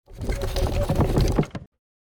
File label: Catapult charging medieval crackling